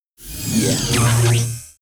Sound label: WAR POWERUP; HOBOCUTTER